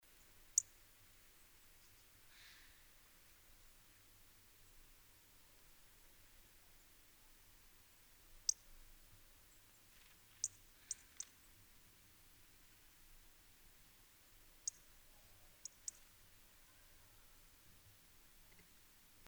Erithacus rubecula; SETE

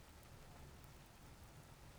pulses, BALAENOPTERA; MONACO 2022-07

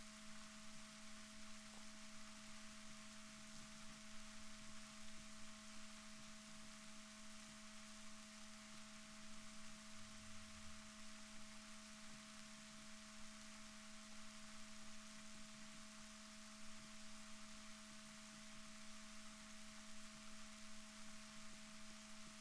rorquals positif, Balaenoptera Physalus Chile

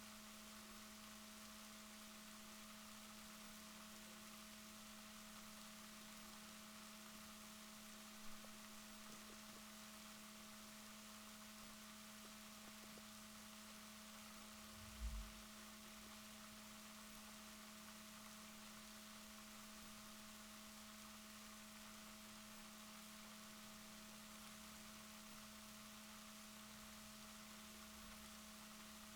rorquals positif, Balaenoptera Physalus Chile

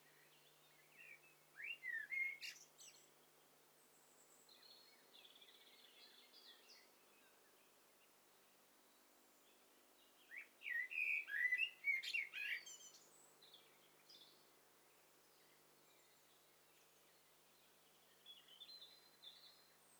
STEREO BIRD COCKTAIL PARTY

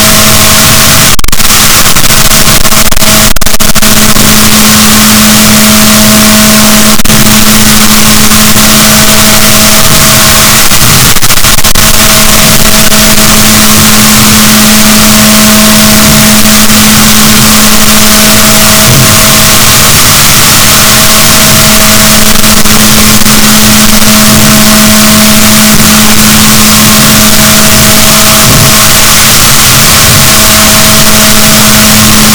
rorquals positif, Balaenoptera Physalus; boat; SNR -6dB

